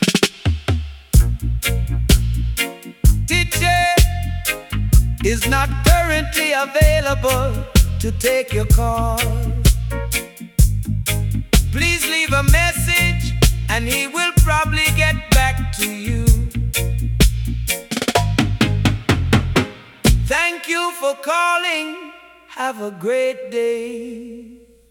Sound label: reggae tele